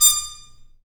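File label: brass trumpet mute stab, Harmon stabs; Harmon Amb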